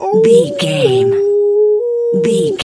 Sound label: wolf howl soft